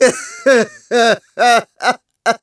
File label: Maniacal Laugh; Ghirahim; Skyward Sword